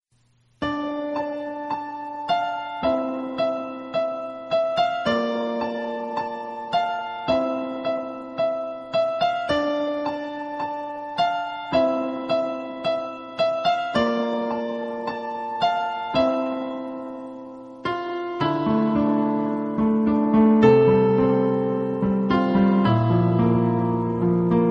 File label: piano cover